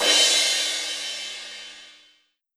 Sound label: soft hit finish; osu!